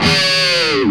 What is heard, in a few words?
Feedback, Industrial; Nasty